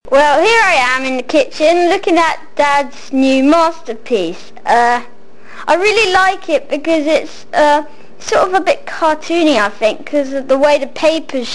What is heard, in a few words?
mother and son and their piano playing; while being painted